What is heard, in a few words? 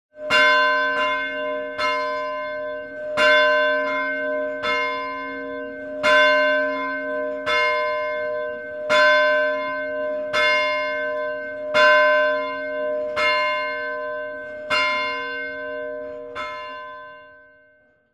wren bell ringtone